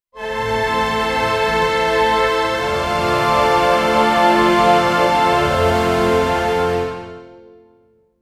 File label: a triumphant and devotion, triumphant